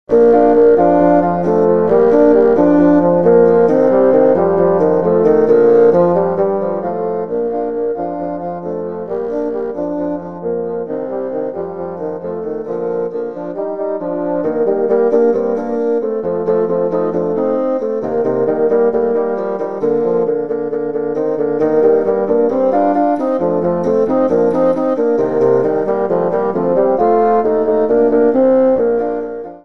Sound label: Rumba